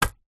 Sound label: gems small bag fabric set down table